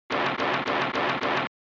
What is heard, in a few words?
Racing Sands; SNES